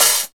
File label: dry open hi hat sound a sharp key, open hi hat one shot tuned to the A# note